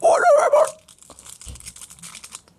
HI CHEW WRAPPER FINGERING

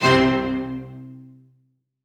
Ensemble C3; Synthetic's Serum Collection; Aubit; ChainPop Vol. 1

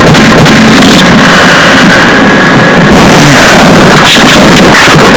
Cross Between A Yawn And A Sigh

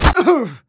pain, player; Team Fortress 2

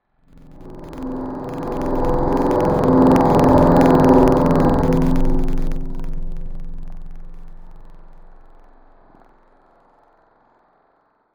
Vocal - Blowing It; Wavetables; Synthetic's Serum Collection; ESW Core Wavetables; Echo Sound Works Core Tables

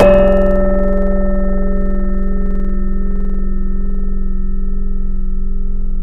Chroma, Tropical Mallets

